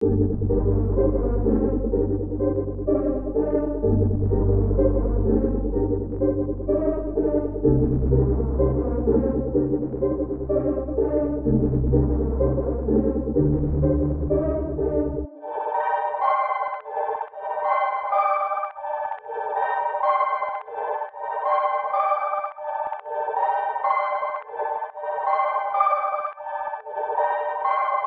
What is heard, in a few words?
126 BPM G Min, Melody; Go Faster; Cymatics; 2022 Melody Collection Platinum Expansion